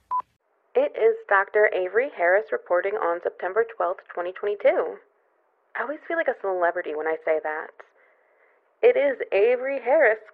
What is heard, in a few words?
Voice Changer Wet Noises